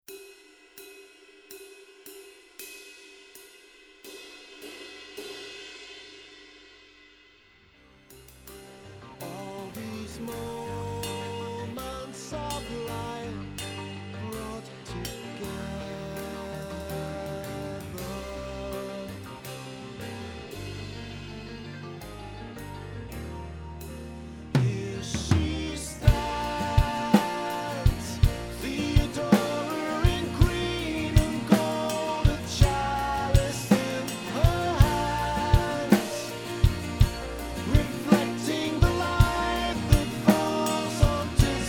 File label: ride cymbal